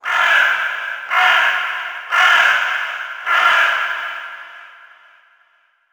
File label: environment crow